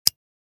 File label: Prisoner handcuffs latch opening lever release click